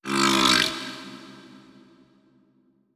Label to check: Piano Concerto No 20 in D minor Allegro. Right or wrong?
Wrong